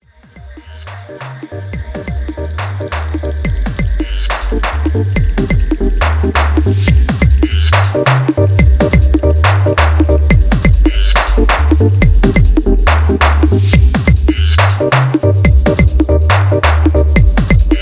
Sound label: DUBSTEP